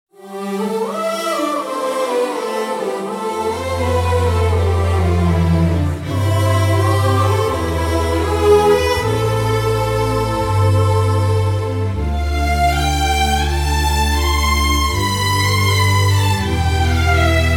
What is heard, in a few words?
theme Music; New Year Eve; Gajani